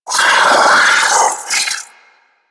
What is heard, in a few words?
Sentient Battalyst Vocal Attack; Warframe; Sentients